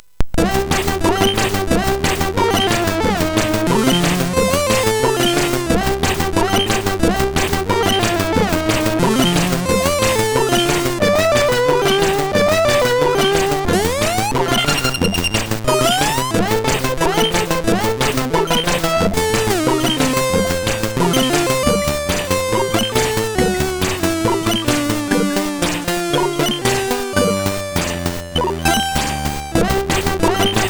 Go Music, SID MOS 6581R4

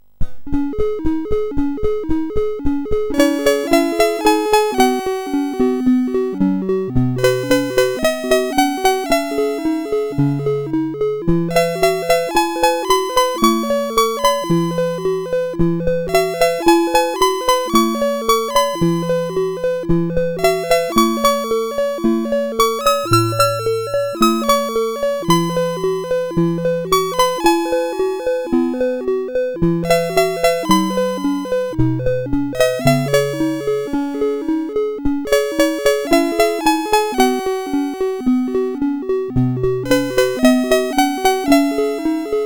sid, CSG8580R5

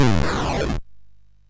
Moon Hop, sid, MOS6581R3

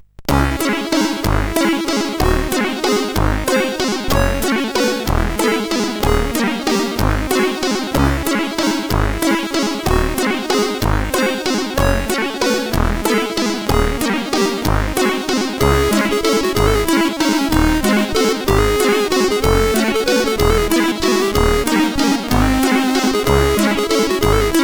sid, CSG8580R5

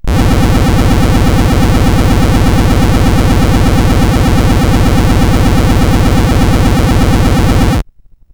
Manic Miner 64DX, CSG8580R5

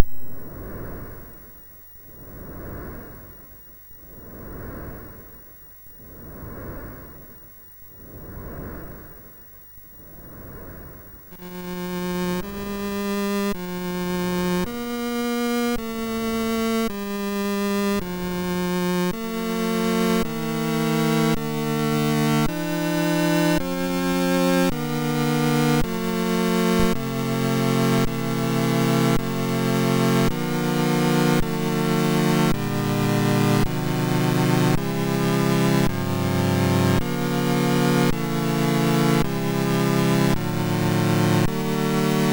Beach, MOS6581R2

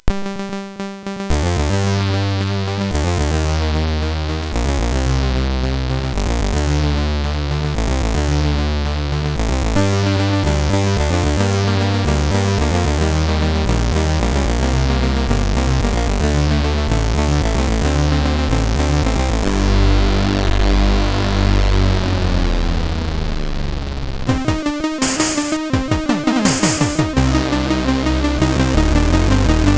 Loader, CSG8580R5

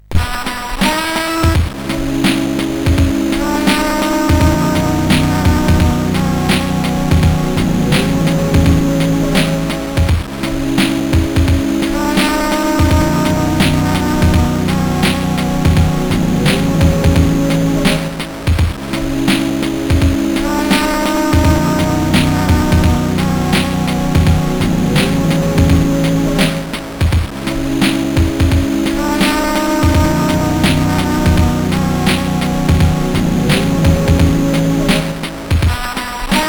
Hip Hop, CSG8580R5

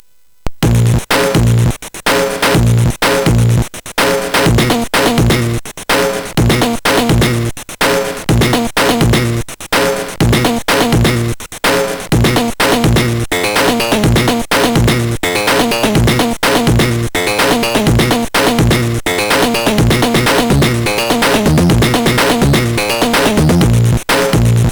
Bassamp, MOS6581R4; hvsc

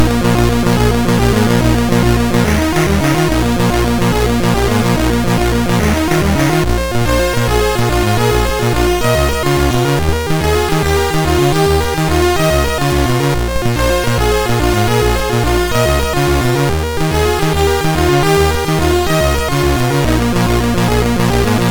Mini Melodies, SID MOS6581R3

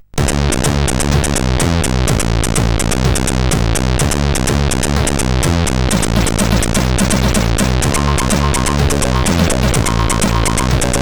menu, sid CSG8580R5; Future Fighter

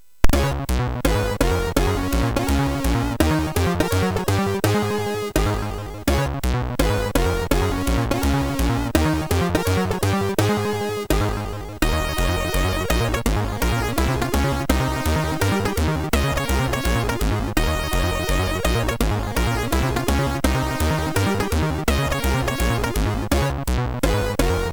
Jazz, MOS6581; Jazz Time; hvsc

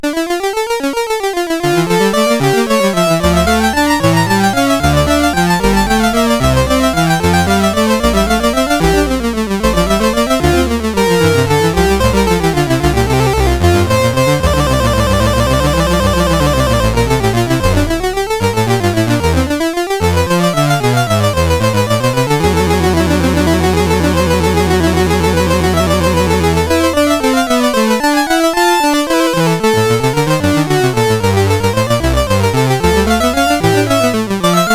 Invention in D Minor, CSG8580R5

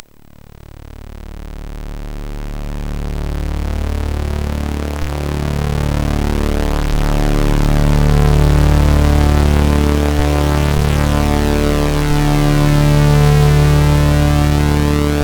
Sinister Opener, sid MOS6581R4; hvsc

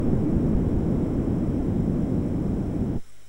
Incidental sid MOS6581R4; Street Fighter II; hvsc

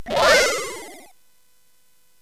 SFX; Narco Police; hvsc

